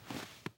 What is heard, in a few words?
snow step